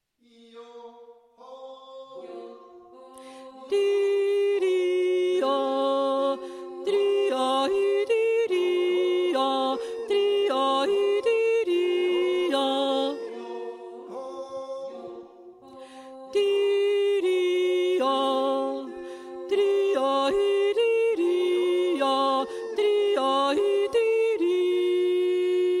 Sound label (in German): 3 stimme, jodler